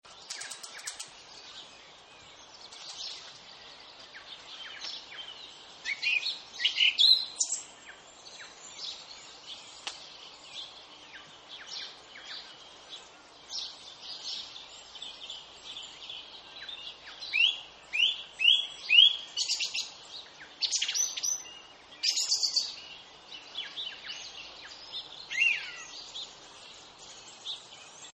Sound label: Birds Tasman Wind; Nature Scenes; Animals; Partners In Rhyme